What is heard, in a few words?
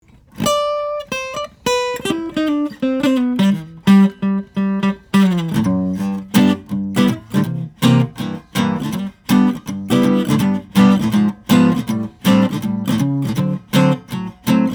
Gibson L-5, guitars; 1937; maple; spruce; archtop guitars; acoustic